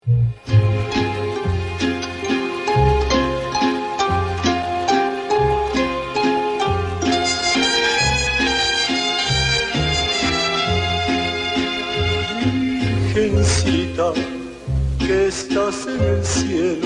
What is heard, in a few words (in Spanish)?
lamento de las campanas, mariachi; nuestras canciones para celebrar el 12 de diciembre